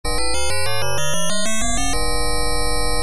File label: All Metal